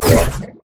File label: mariner bomb; LoL; fizz skin04